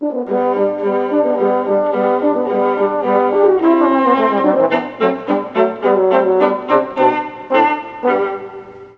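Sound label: trombone horn viola; Juilliard Music Adventure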